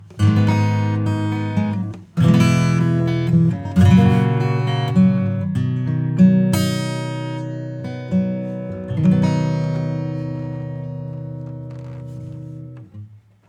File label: EQ acoustic 2band British; Kirchhoff-EQ